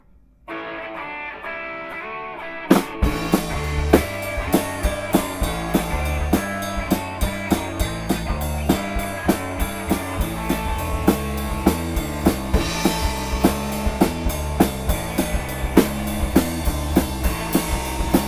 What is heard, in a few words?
guitar Solo